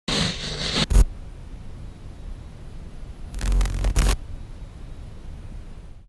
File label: startup; menu